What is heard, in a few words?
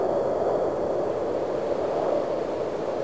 pit sfx; de som